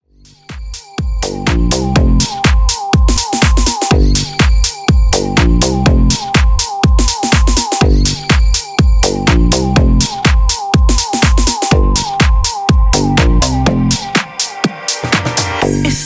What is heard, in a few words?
Club Extended Mix 123 Bpm